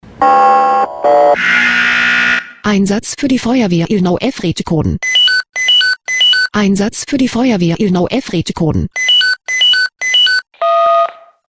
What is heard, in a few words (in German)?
SMS Alarmierungstone; FW Illnau Eff